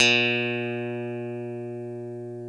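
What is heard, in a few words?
guitar Strat, Brite Strat, clean electric guitar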